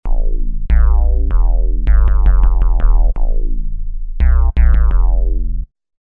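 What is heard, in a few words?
Hardcore Bass, Roland V-Synth; Factory Patches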